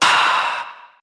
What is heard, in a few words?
"ah" exhale